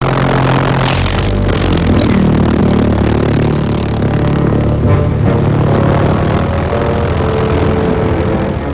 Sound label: V-1 pulsejet; avanim